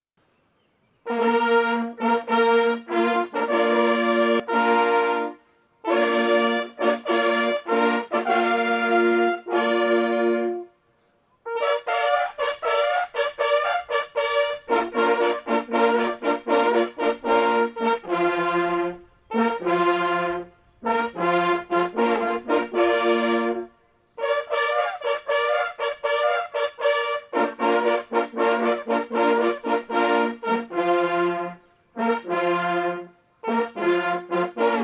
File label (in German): Jagdhornbläser Münsterlandfanfare; Holthausen